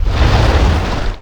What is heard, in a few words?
Volcano Lava Erupt Splash; Destruction